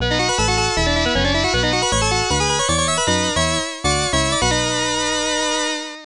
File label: Area Clear; Valtric; arcade